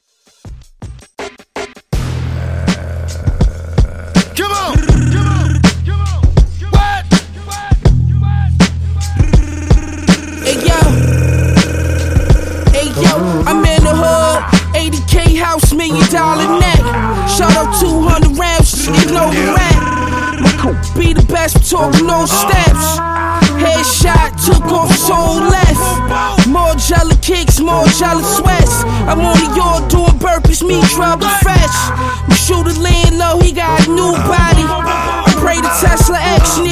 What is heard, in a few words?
Hip Hop RB Redrum; Remixfreakz